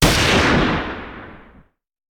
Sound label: Hand Gun; Sound Ideas; Guns